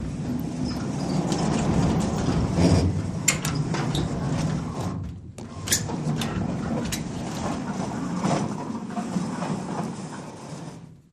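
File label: Barn Door Metal Slide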